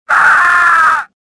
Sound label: falling; Star Wars Jedi Knight II Jedi Outcast; XBOX